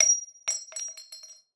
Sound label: casing 40mm; gmod; arccw uc commons attachments